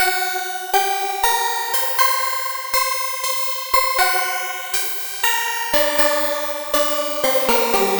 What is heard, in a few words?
Guitar Based; Eclectic Electronica